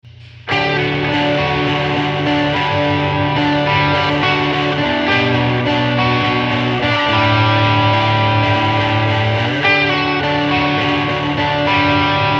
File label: slap